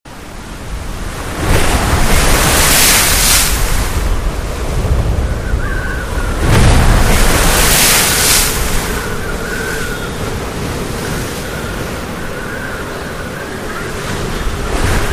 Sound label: Sea Storm; Heavy Surf And Screaming Wind